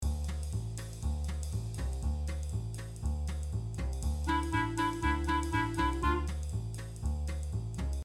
LOOP Haunted

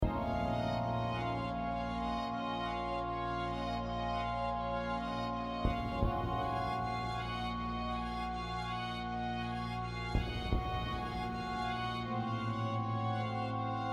Transmission Towers underscore, loop

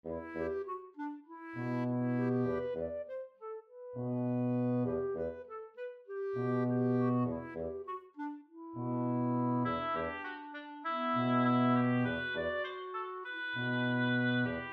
children music

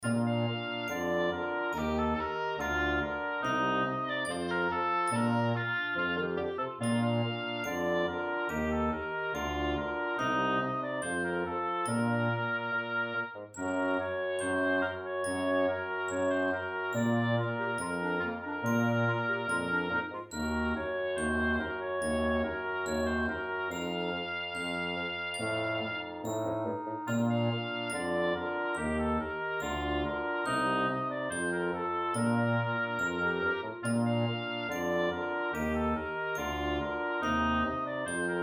children music

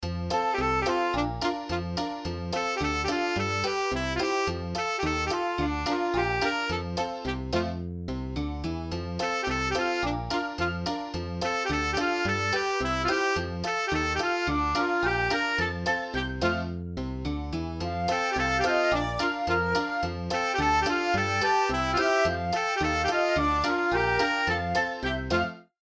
Childrens music

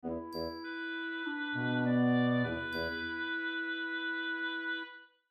Childrens music

